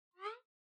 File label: Smartphone UI Sound Speaker